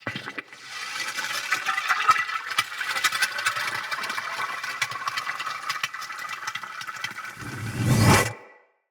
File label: Shrimp Lantern Burst Warning; Creatures; Baliq